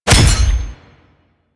Ghoul Devourer Body Impact; Warframe; Grineer Ghouls

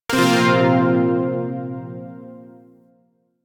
Shawzin Hirajoshi Chord K; Chords; Warframe; Zariman Shawzin

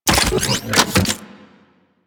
Briefcase Launcher Equip; Warframe; Weapons; Corpus

